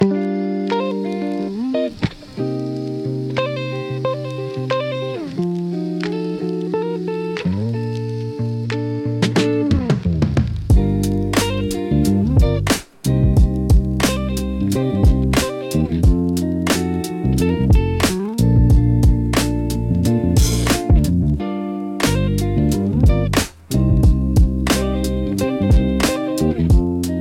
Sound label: romantic lo fi funky guitar mid tempo; for party